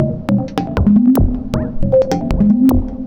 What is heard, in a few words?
Mod Loop, Modular Percussion